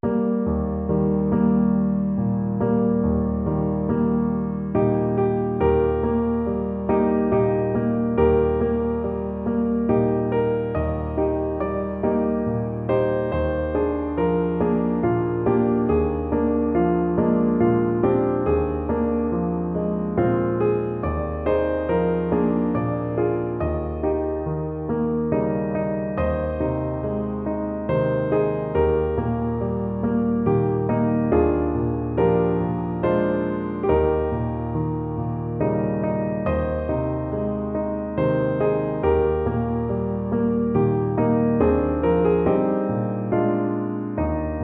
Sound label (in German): Wiegenlied; Guten Abend Gut Nacht; Op 49 Nr 4; Johannes Brahms